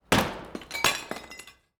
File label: Figure Break